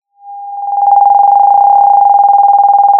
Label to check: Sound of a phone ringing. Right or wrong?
Right